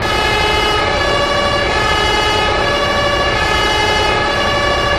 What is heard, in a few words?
horn; Manus Civil Package